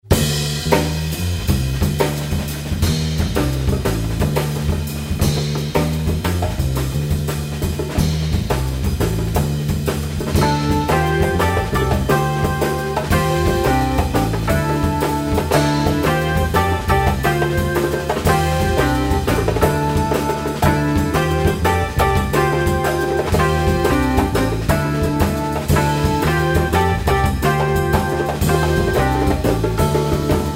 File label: mazurka